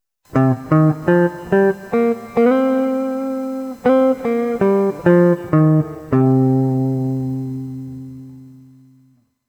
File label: blues scale; Blues e improvvisazione